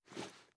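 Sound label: sniper reload cloth; gmod; viper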